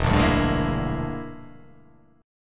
E 7th Sharp 9th Flat 5th, chord

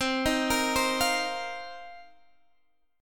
F 7th Suspended 4th C

